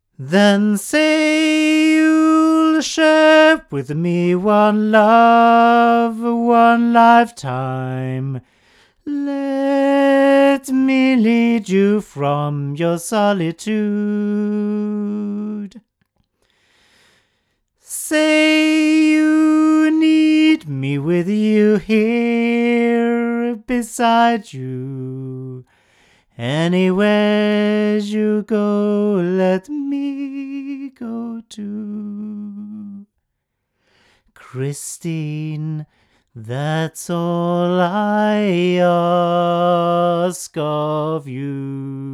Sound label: Studio Vocalist male; crowley tripp